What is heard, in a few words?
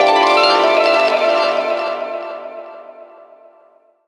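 harp TURN FX; Rawcutz - Nu Forms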